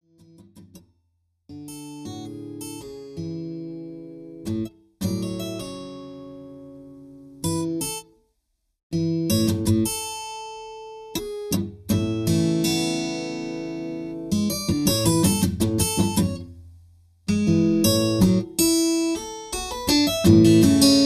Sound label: Guitar